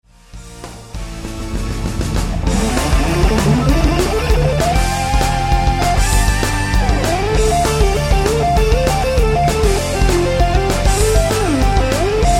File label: ROCK SOLO